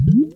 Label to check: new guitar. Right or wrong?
Wrong